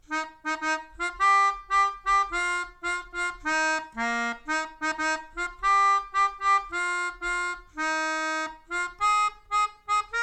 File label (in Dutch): Melodica